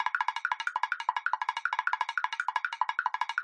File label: Motion Tremble Loop